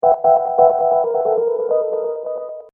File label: Hip Hop Dark Rhodes Licks 90 Bpm Swing E; Keyboard Loops; Lynne Music